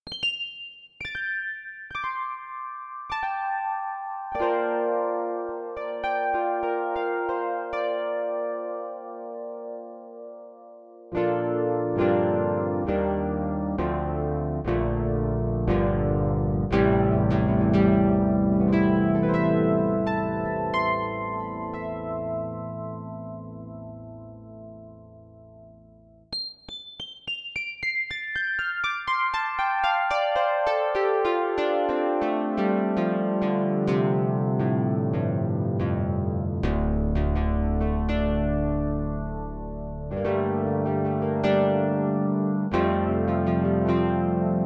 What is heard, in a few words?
karplus, sampler